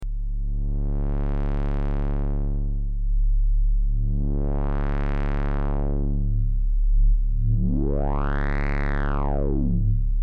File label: low sq